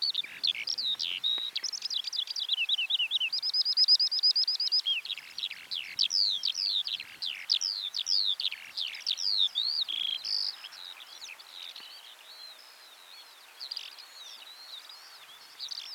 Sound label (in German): Feldlerche Alauda arvensis